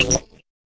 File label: fx drum